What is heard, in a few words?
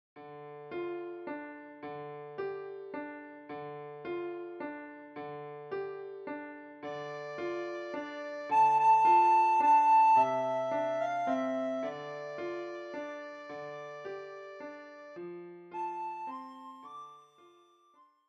Scarborough Fair